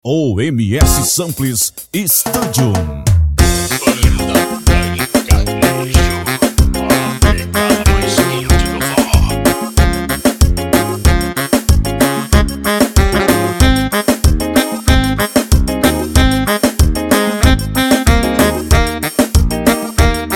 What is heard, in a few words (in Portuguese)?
ritmo banda pancanejo